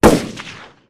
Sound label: gunshot large; gfuel